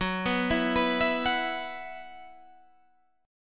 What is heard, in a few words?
F sharp 7th Suspended 4th; arpeggiated; 14,14,14,16,17,14; F#7sus4 Chord